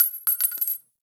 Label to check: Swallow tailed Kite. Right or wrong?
Wrong